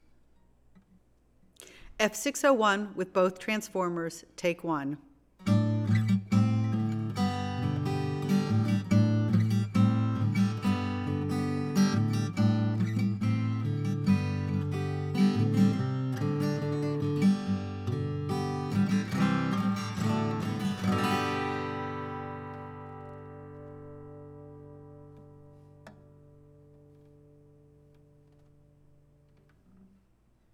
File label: Aco Gtr